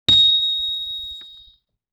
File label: LoFi Metal Chime Hit, Vintage Cartoon